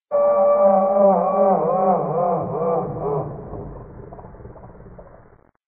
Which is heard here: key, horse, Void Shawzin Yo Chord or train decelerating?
horse